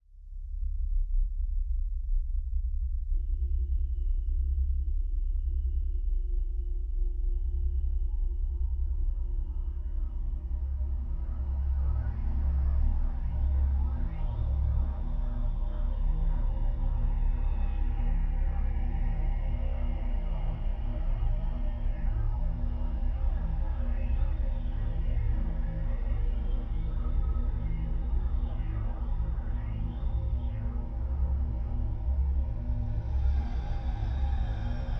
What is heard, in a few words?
Spooky Sci-Fi Atmosphere, Horror; Sound Design